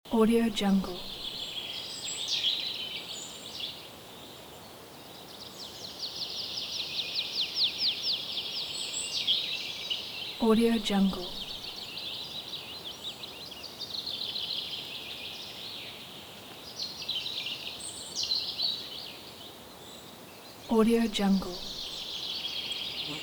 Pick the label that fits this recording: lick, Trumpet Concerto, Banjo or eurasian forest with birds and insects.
eurasian forest with birds and insects